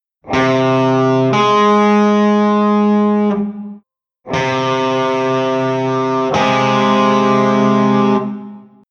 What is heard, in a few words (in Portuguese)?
Intervalo de Sexta Menor